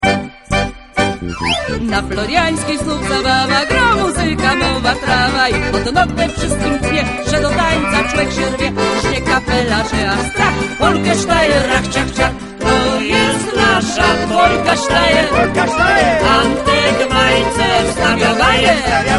polka sztajer

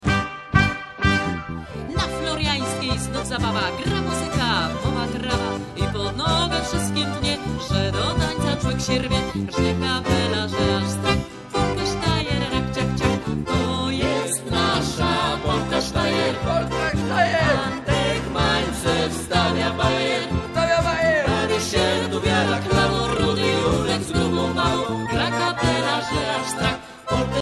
polka sztajer

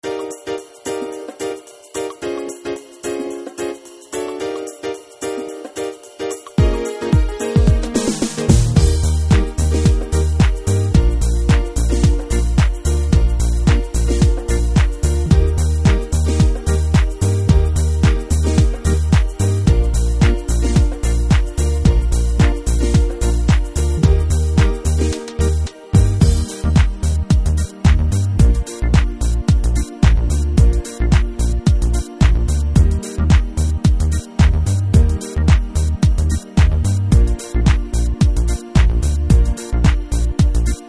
wierze instr

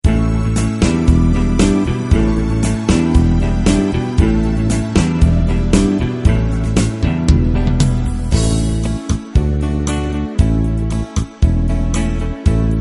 tak nam trudno instr

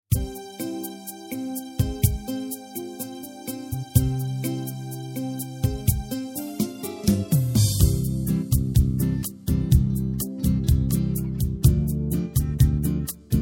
moj bog instr

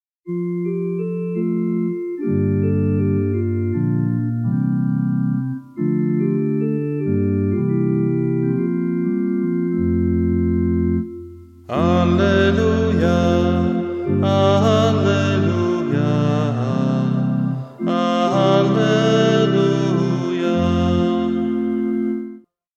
Wielkanoc alleluja

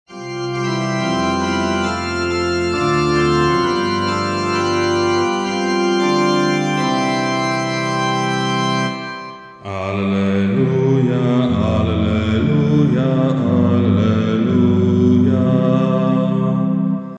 Adwent alleluja

